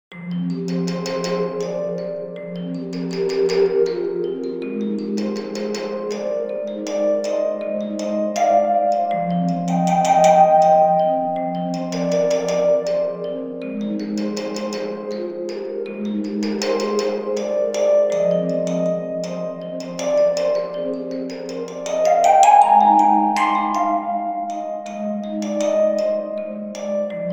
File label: Oiltank Music Box; Omnisphere